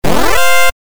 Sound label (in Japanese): 勝利 ファンファーレ レトロ; モノラル